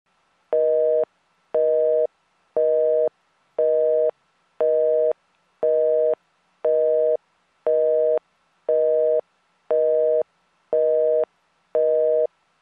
Short phone beeps

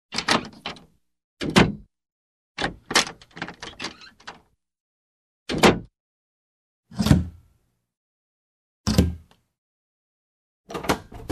Wooden door opens, closes

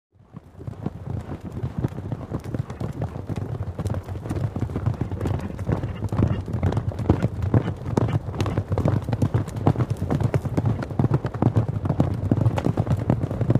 several horses gallop

